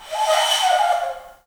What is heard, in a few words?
AF FLUTES, Heart of Africa; Spectrasonics; Heart of Africa 1